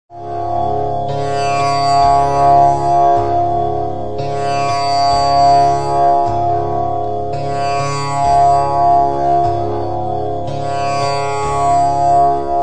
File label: Tambura